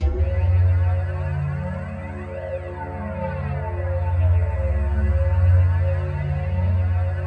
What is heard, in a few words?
R2D2; Masterbits - Total Zone; FX ZONE